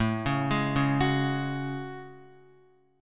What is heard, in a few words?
A Major 13th, down Guitar; AM13; arpeggiated; JGuitar